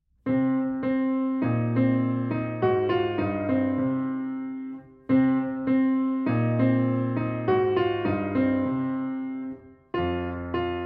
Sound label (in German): B-Vorzeichen-Blues; Avanti Musicanti